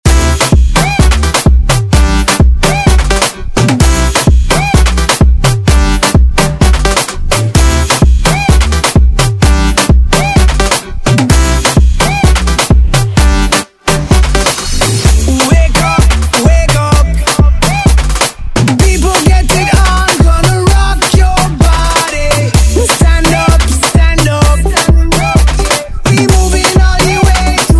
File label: Afrobeat Redrum